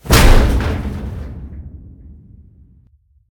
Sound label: metal; CosmicRage; combat; bigtail